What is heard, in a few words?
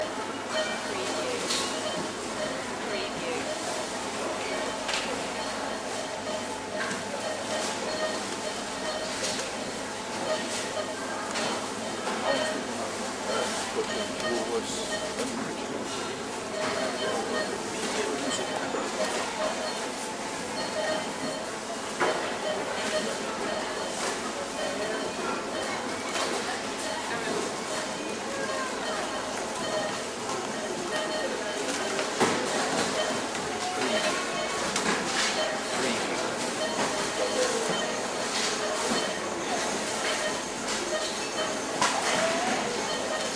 supermarket busier